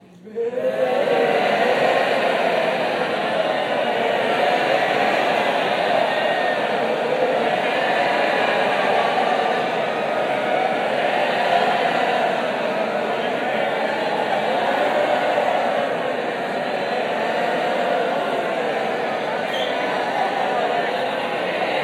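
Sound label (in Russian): восклицание люди массовый ужасающий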